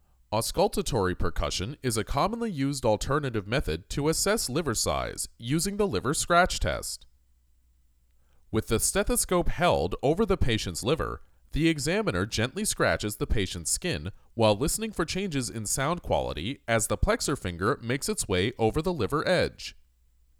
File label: Percussion